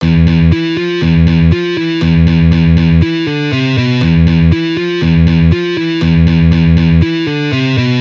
String skipping, guitar

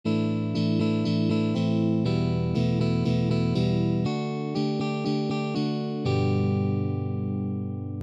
Guitar exercises

